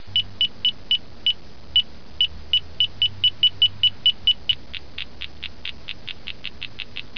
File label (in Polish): Syrena Ostrzegająca Tornado